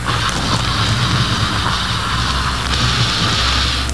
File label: zombie idle